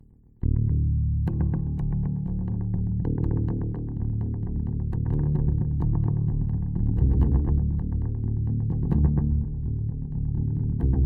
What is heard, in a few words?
bass run